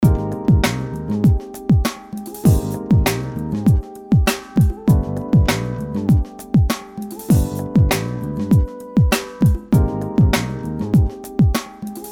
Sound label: steel beat, music